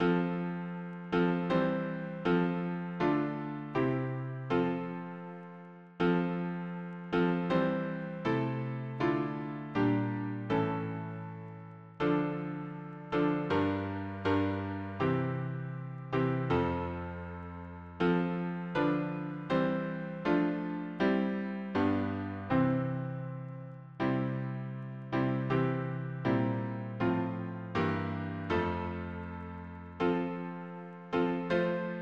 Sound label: harmony piano